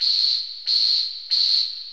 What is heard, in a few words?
drop mine; wob2 demo